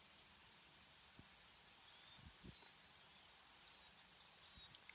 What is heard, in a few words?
Kestrel Call; birds